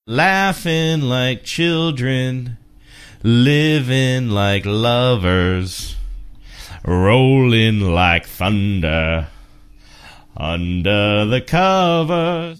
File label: sing